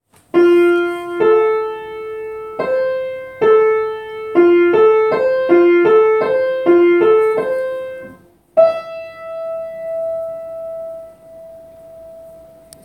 Skipping in space, Skipping in Space Played on Upright Piano